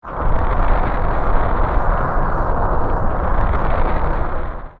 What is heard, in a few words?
Volvagia Emerge; OOT